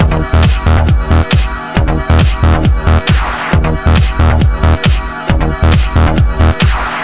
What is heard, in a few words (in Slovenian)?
bass izsek